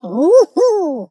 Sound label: player victory; fallguys